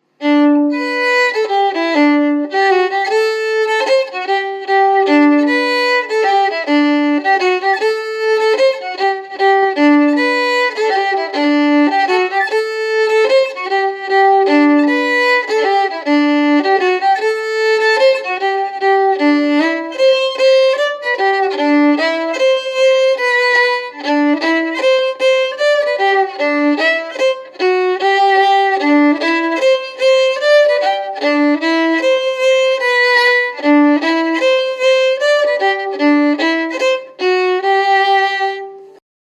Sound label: Polka Mazurka; Bill McGlashans